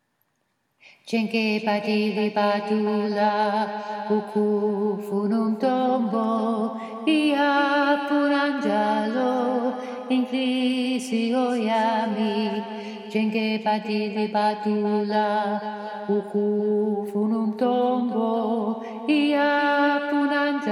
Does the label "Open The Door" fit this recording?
No